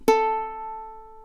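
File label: ukulele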